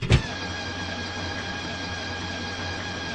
Aircrafts Engines Rolls Royce Merlin Starter; DCS

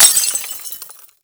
glass; Call of Duty Modern Warfare

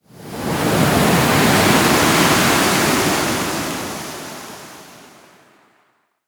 damage vehicle; World Of Warships

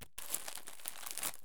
item MRE food take; Escape from Tarkov

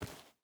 footstep barefoot dirt walk; Rust

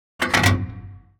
gun reload; World of Tanks

